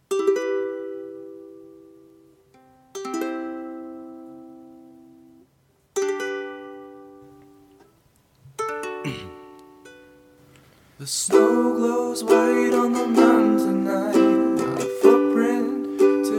ukulele cover

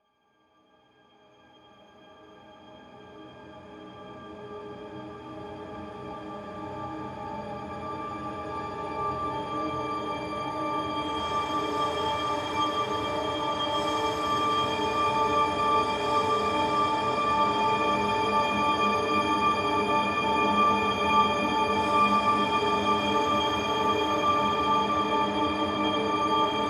far bell; Rialasten 2022 Xmas Sample Pack; 2022 Xmas Packs

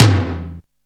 TOM, Jungle; Gorge Sample Pack Vol.1; San Gabriel

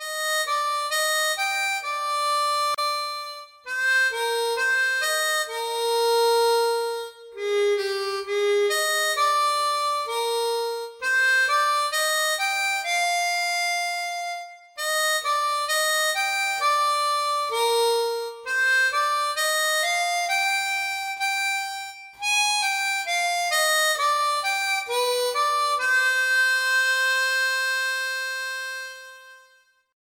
Harmonica short

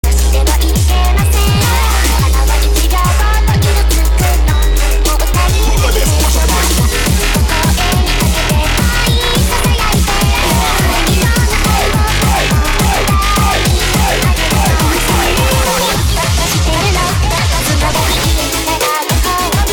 200bpm piep kick

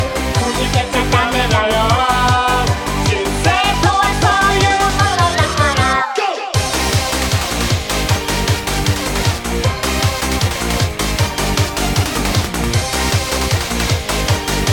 Beatbox

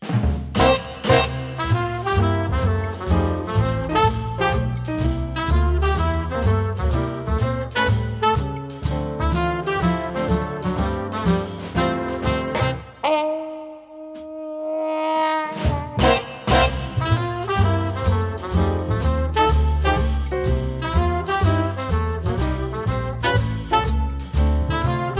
Gentle Forest Jazz

